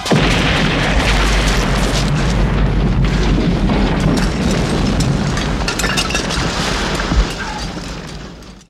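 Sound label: Explosion Large Debris; Destruction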